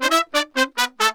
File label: MERENGUE, World Grooves